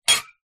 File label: household rake metal set down on concrete